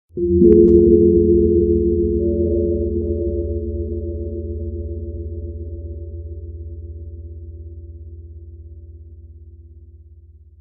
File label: Sound quest Busqueda